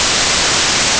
white noise

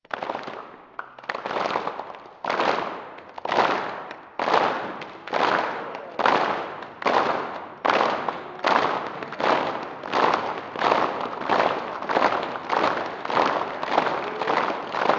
applause

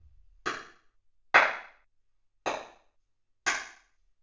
clapping reverb; dereverb

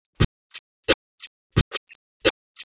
drums alg; dereverb

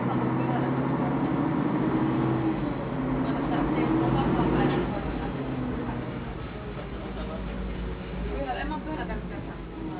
street, noise

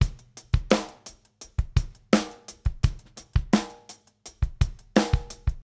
drums

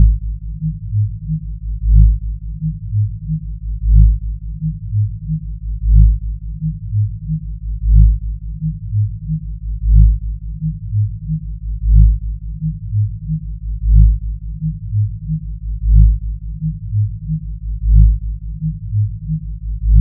risset beats

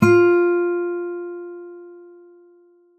ks pluck F4

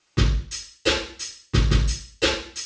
drums reverb; dereverb

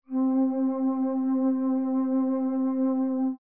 flute C5 pitch shift down octave

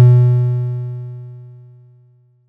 waveguide B3

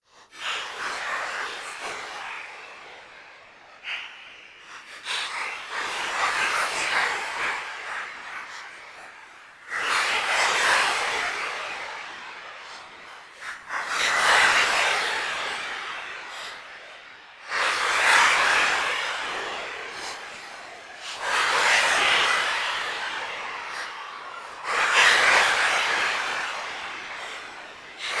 applause

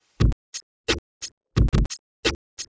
drums oracle; dereverb

